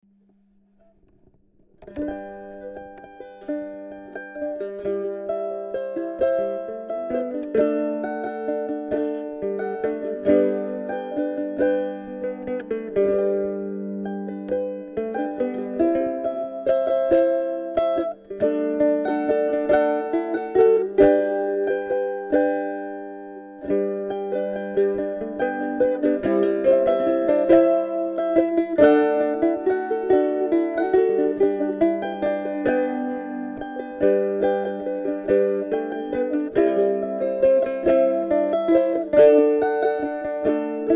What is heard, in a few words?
2 mandolins